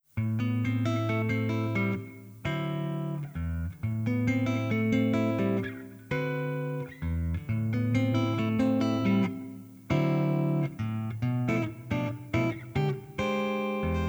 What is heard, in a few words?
Jazz Band; The Autumn Leaves Jazz Band